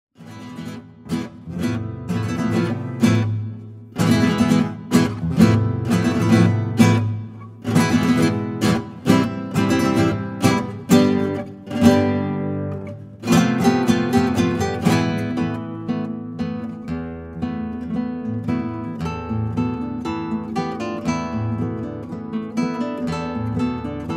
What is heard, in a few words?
Danza del molinero, gitarre flamenco